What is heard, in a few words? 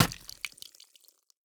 Bloodshed